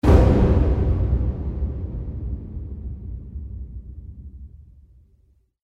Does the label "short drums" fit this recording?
Yes